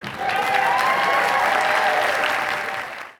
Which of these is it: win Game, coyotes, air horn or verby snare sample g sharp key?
win Game